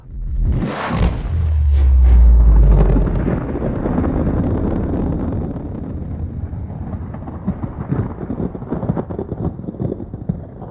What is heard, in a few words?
explosion a flock; svencoop; portal house